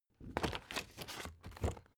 spring binder open

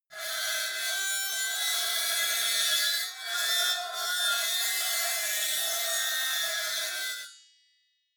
metal lid screech

